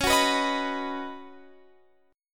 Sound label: D sharp Minor 7th Sharp 5th